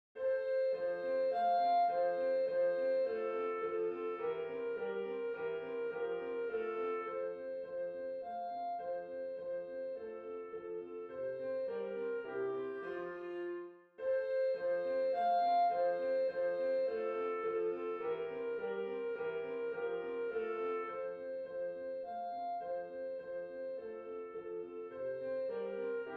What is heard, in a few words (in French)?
danse allemande; beethoven ludwig van